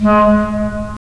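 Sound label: wind clarinet; LA Composer vol. 3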